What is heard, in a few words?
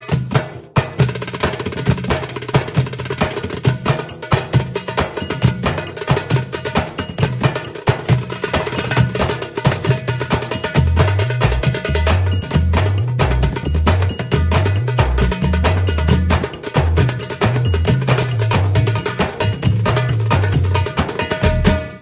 Darbuka Beats